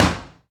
Spit Out; blastmorph pinball; xbox series xs

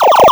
Retro Bonus Sound; Blastmorph Pinball; xbox series xs

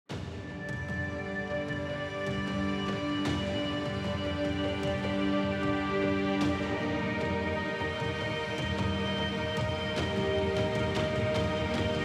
ending Music; fantasy strike; Geiger

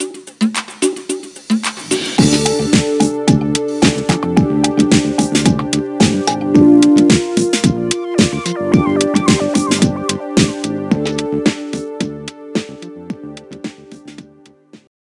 MiniGame Victory; My Horse & Me

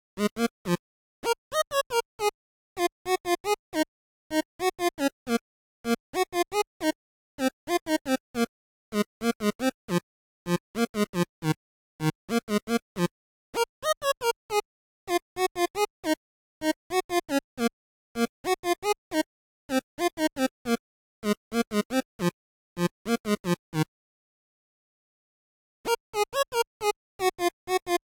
Melody; chippy

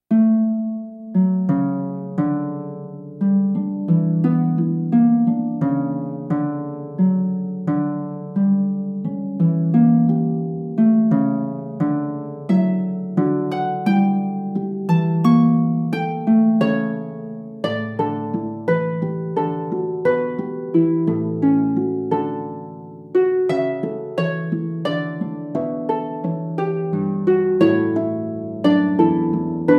harp short; gamerip; black circle a carol reed mystery

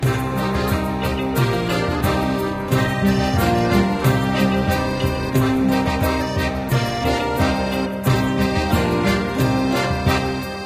Pirate; Diner Dash Flo Through Time

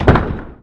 castle door close; princess room sfx

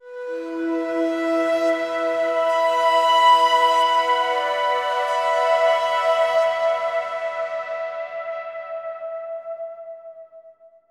Synth E Long; torchlight iii; ps4

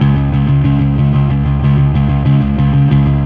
Fuzz C sharp 2

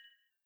stop; vitamin connection switch gamerip 2020

